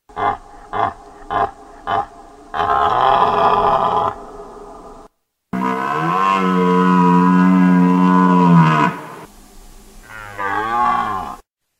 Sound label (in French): sonnerie brame du cerf ringtone